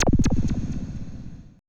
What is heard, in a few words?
AMBI FX